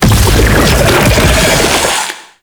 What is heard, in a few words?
Unearth Dig; Octopede; Warframe; ManInTheWall